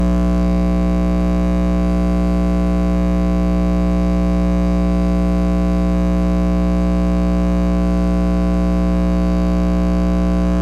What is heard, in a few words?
Speaker Buzz